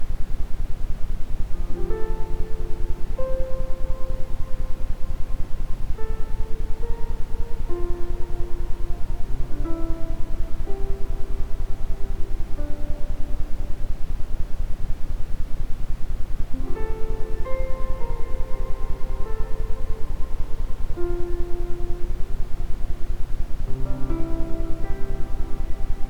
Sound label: isochronic, Ambient Sound; Entspannung Erholung; isochrone toene